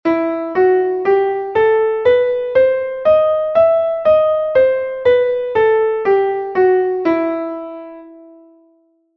mi menor armonica

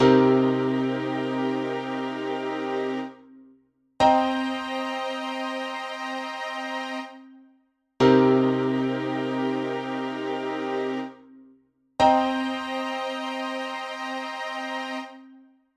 acorde septima menor

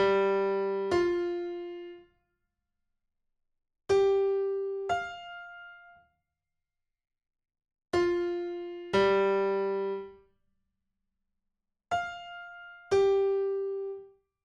Intervalo septima menor

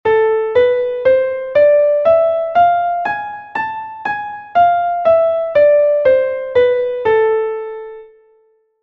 la menor armonica

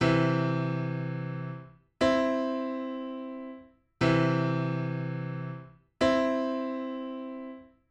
Intervalo armonico tercera mayor

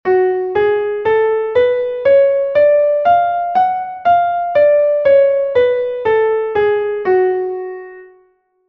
fa sostenido menor armonica

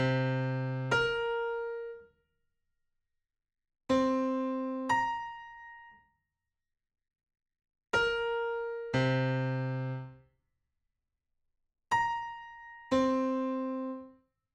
intervalo catorceava menor

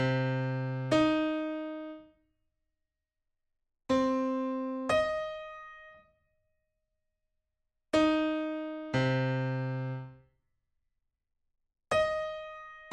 Intervalo decena menor

